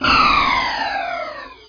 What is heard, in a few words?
Minimize; Star Wars; Racer